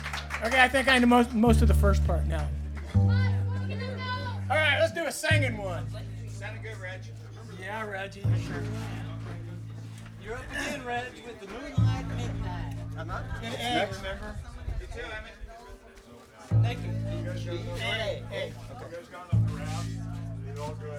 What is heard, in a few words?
tuning; Keystone Festival 9/14/2025